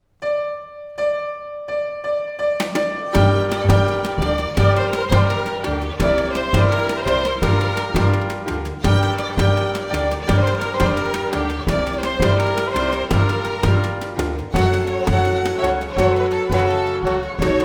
Violins' Leitmotif

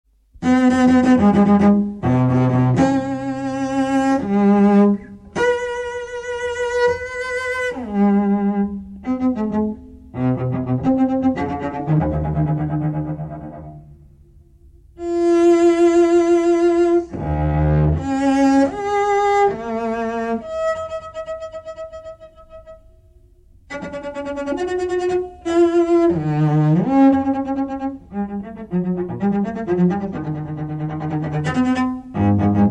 Presto, Cello Solo

